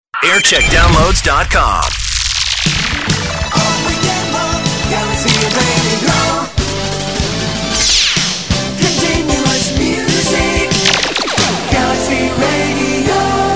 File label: jingles; galaxy